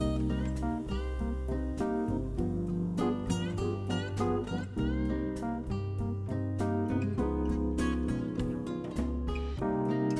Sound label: Jazz Cafe Relax